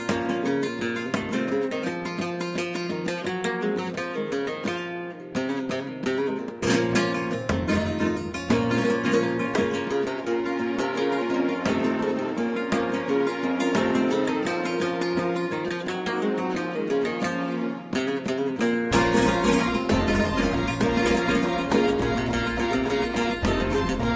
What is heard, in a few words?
flamenco